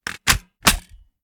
reload empty mag mag in, reloads; gmod

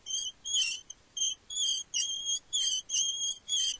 slow, tricycle; vehicles; gmod; tdmcars

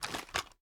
ar inspect, weapons; viper

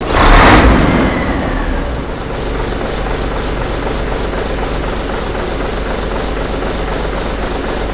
v8 rev short loop; ratmobile; vehicles

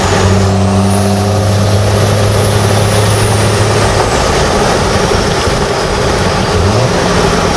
fourth cruise, vehicles

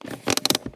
handbrake; gmod; svmod

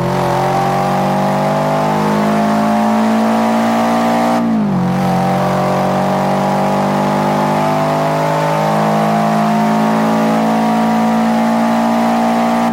third, vehicles

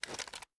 reload empty fast rotate; reloads; gmod

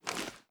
ar reload fast raise, weapons; gmod; viper

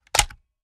akilo47 selector on, weapons; viper

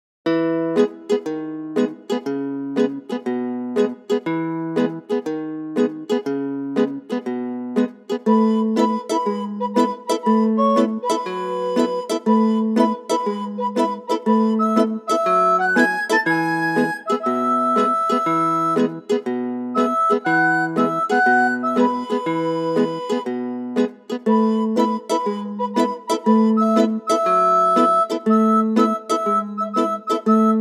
Dolphin's Whistle Eee! Eeee!

